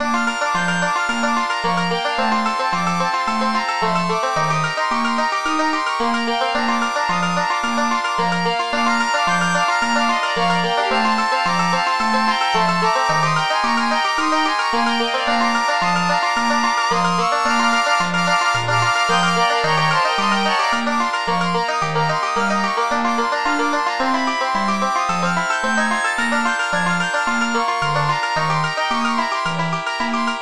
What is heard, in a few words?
ensnare track 4 long; juvenilia